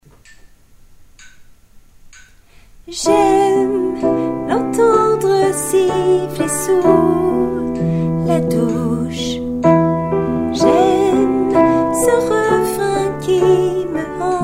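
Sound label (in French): soprano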